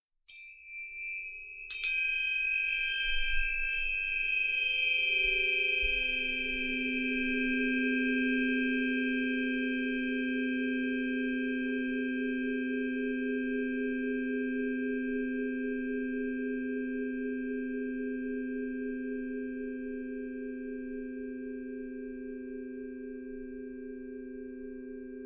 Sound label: fibonacci tuning forks